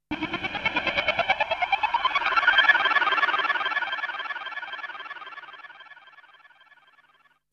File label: stereo beep